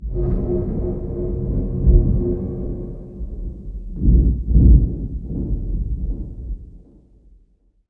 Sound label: eerie